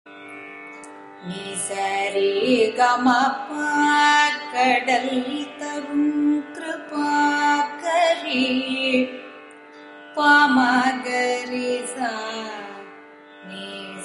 kalpanaswaras, carnatic music

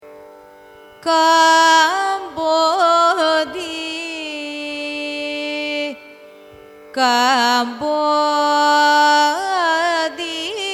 raga kambhoji signature; carnatic music

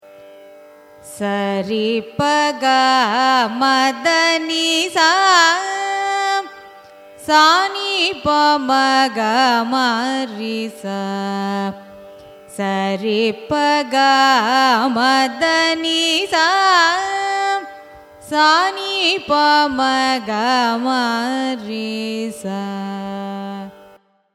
raga kanada arohanam avarohanam; carnatic music

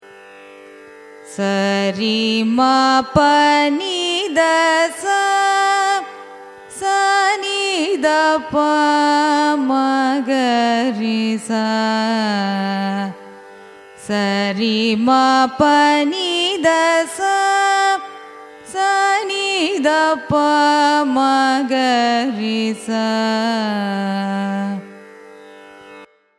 raga mukhari arohanam avarohanam; carnatic music

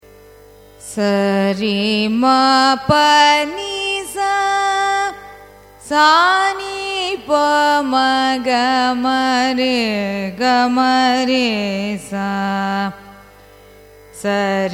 raga gowlai arohanam avarohanam; carnatic music

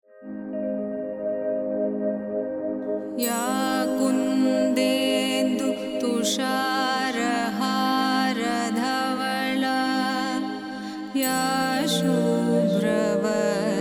song kalyani, carnatic music

